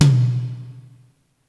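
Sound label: pop toms, drum kit; Giga Samples Collection; ELQ-DrumQit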